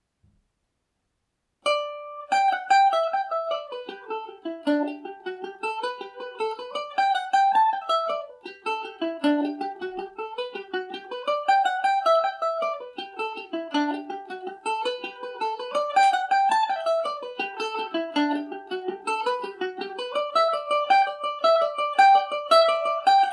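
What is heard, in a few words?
blackthorn stick revisited G major, mandolin; The Blackthorn Stick; G&O #34 mandolin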